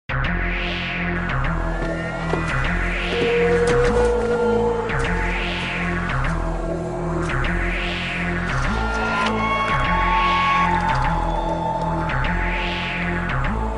alien beat

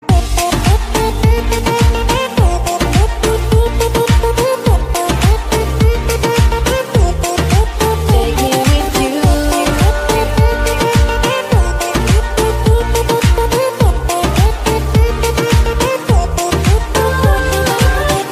new tune

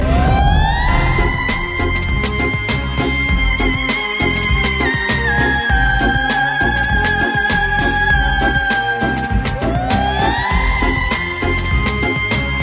Opera

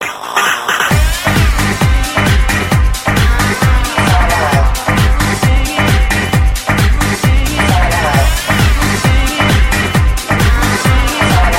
Dj Mixing

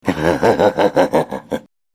Cannon; Inferno

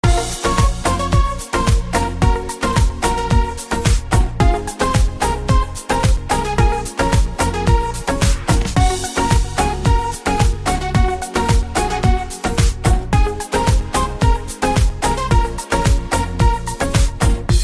Telephone music

